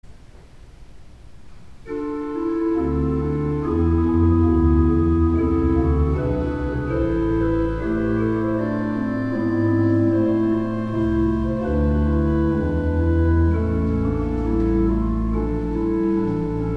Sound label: Organ, Chorale Prelude; Johann Sebastian Bach; 'Christ Lay In The Bonds Of Death' (Orgelbuchlein); Maundy Thursday; St. John's Cathedral, Jacksonville Florida